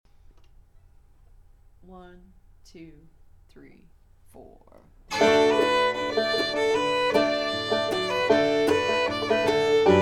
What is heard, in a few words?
Angeline the Baker Slow Jam, fiddle